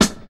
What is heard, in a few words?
natural reggae snare D key; snare drum samples